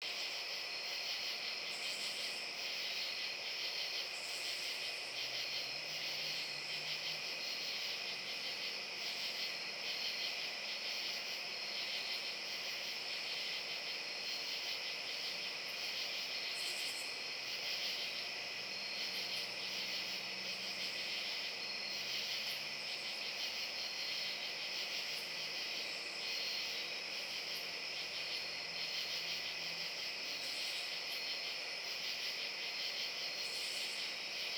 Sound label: night; fx; base pack